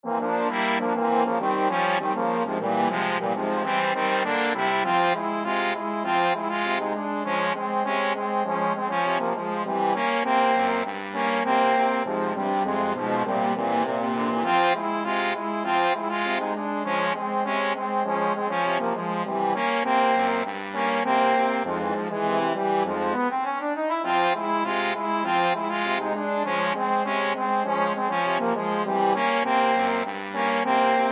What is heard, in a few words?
trombone trio